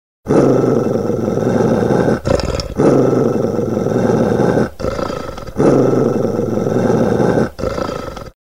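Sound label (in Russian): dog growling growling of a dog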